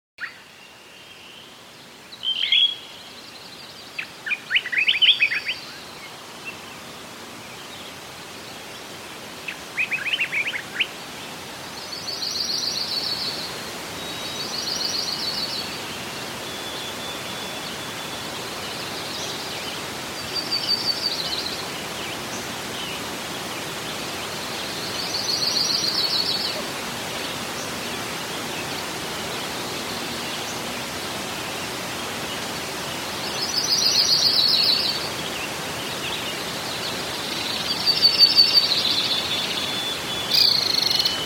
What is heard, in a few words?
Rainforest Dreams mit Sounds; mit Naturgeräuschen; Demo-CD-Wellness